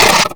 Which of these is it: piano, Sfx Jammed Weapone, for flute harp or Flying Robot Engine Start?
Flying Robot Engine Start